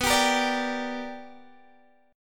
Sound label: B Minor Major 7th Sharp 5th